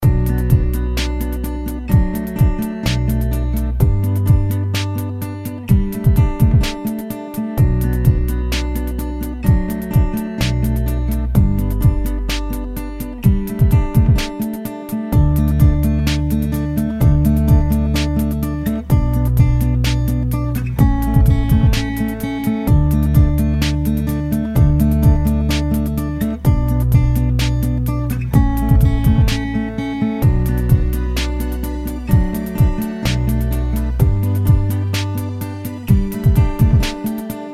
acoustic

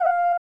Go On

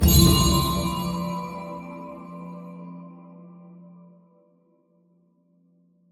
Rune; The Dwarves 2016 ps4 gamerip

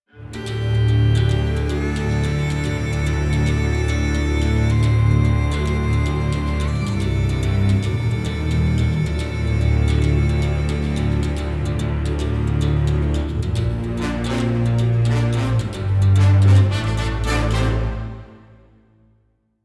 Monster Hunting Combat; The Witcher 3 Wild Hunt; Skellige

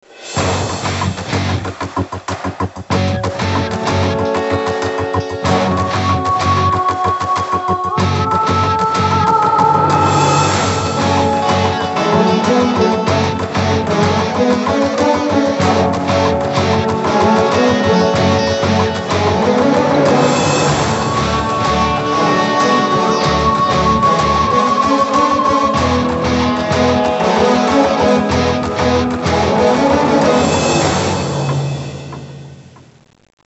Music Mission Intro; generator rex agent of providence 2011 nds gamerip

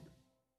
Loop Tail; Good Job

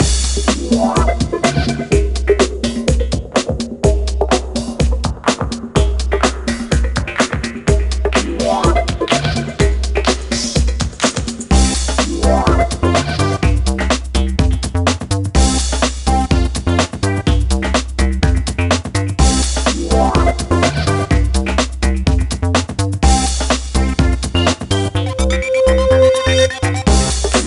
Shake Wag Disco; Beats N Bops; Littlest Pet Shop City Friends Beach Friends Country Friends

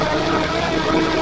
Bio Plasma Beam Loop; A Robot Named Fight

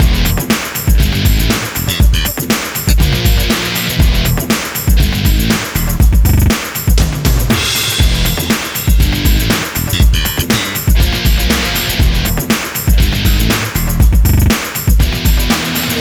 fanfares

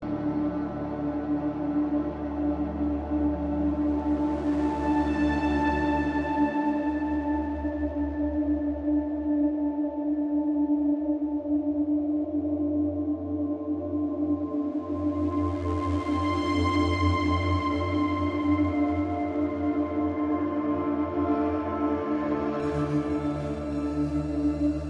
Cinematic Synth Puzzle Loops; Mass Effect Andromeda

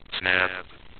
snap; edge extended; android gamerip 2012

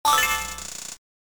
Item Obtained; Dragon Ball Z: Legacy of Goku

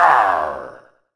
pain

